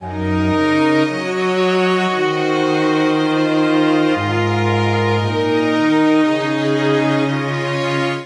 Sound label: Phrase Inquisitive Bb Minor 116 bpm, String Quartet; MIDI Clips; Ableton; Factory Packs; Spitfire Audio